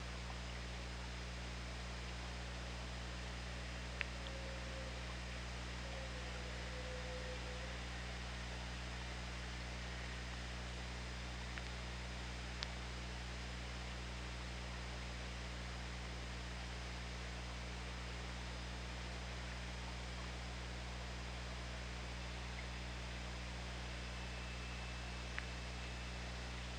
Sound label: OrcaLab